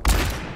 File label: fire; garrysmod